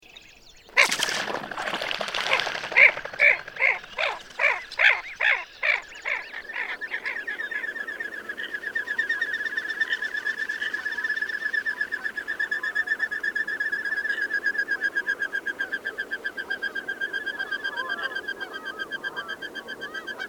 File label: Common Goldeneye